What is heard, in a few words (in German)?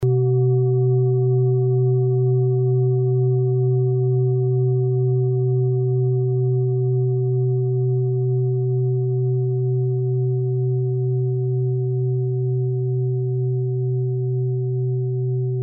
klangschale ladakh